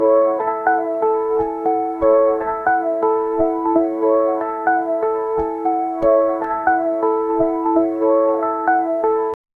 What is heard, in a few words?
eerie, upright bass